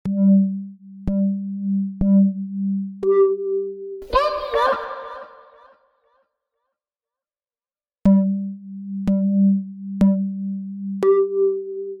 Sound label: radio